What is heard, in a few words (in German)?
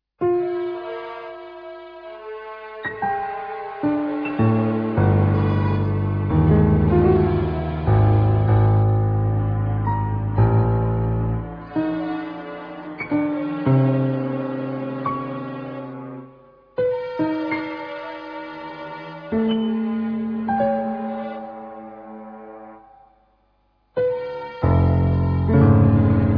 hausmusik